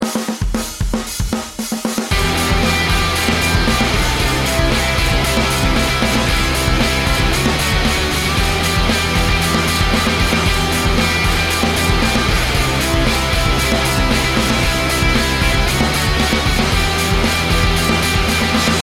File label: Rock